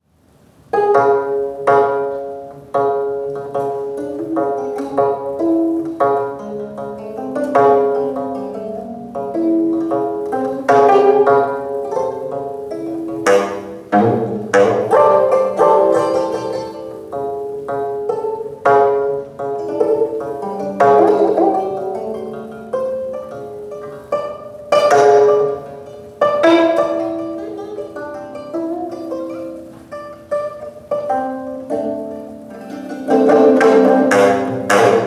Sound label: gayageum poems